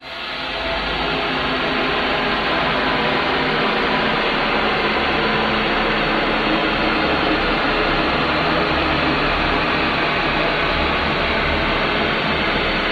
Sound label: Descent- steady falling reverberate tone